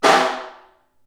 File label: Far, Snare; Ableton Foundation FreePacks; Snare Designer